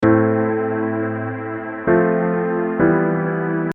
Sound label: example harmony